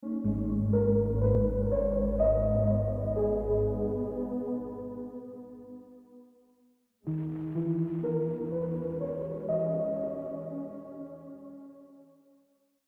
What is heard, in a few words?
Fragile tune